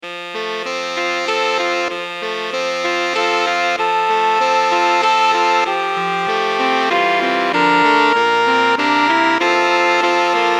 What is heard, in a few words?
saxophone quartet